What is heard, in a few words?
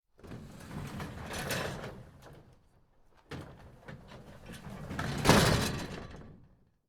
metal scrap rattling